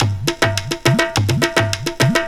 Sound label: marimba, drum loops